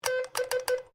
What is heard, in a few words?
MORSE CODE B; Morse code aplphabete; Morse Code (Sound Effects:Electronics)